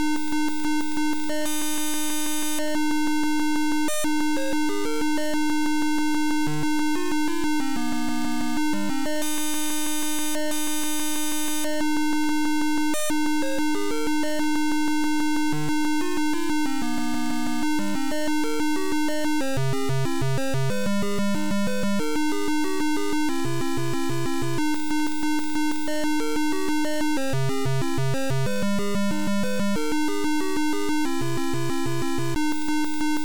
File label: Title Beeper